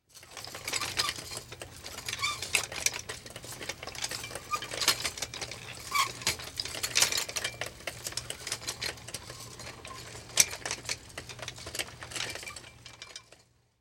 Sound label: Wheelchair Ride By Old And Rattling; Emergency Effects; AdobeCC